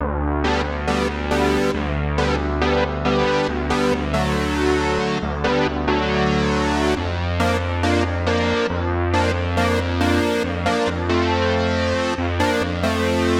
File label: Big Saw Pad